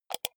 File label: computer mouse wheel click fast